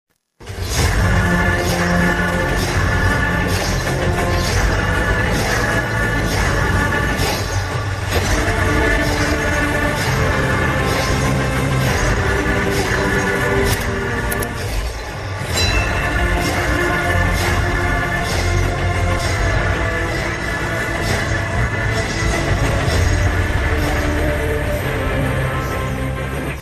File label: BGM tamil ringtone; Salaar